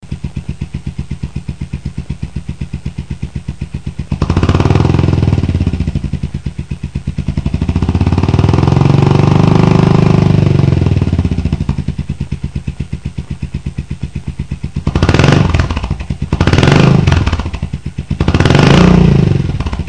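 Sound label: Slip-On, exhaust; Savage MAC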